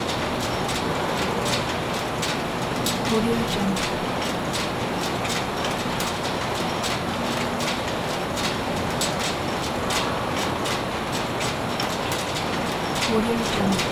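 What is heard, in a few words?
ambience factory; prosoundfx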